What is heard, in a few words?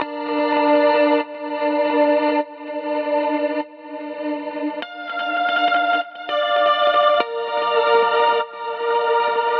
G Gtr Tunnel Verb; Zero-G; Future Wave